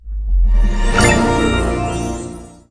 candy Win Reveal Low; fairyhansel

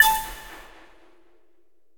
candy Fall Low; fairyhansel

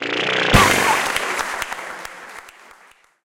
big win text change; scruffy duck

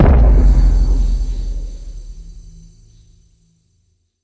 big win start; arcane

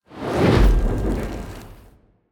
random wilds intro started; arcane

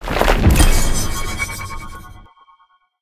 plus Fs; parthenon

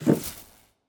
quickstop; arcane

